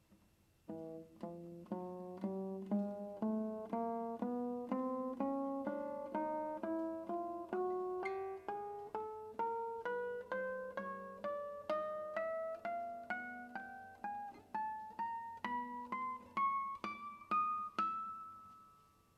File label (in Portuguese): Harmónicos Artificiais